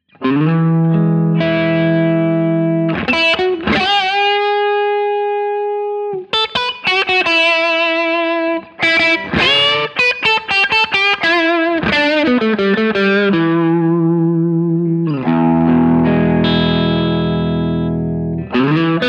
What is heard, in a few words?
characteristic sound; jensentone